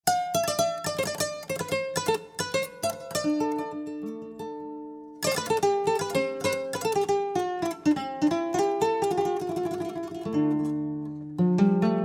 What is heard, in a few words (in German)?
Instrumentalmusik